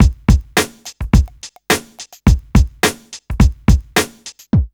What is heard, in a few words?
RNB 106 BEAT, Hip Hop R n B; USB Soundscan vol.19; AKAI